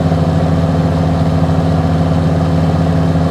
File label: Land Cruiser High